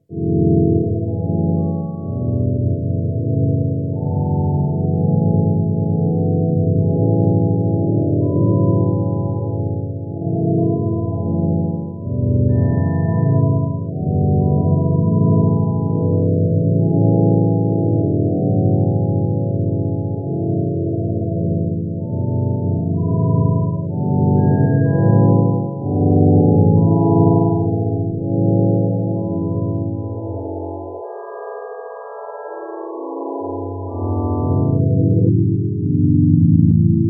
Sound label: Panharmonium Rythmic Drone; Rossum Electro-Music